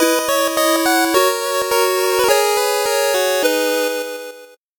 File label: 8bit jingle fast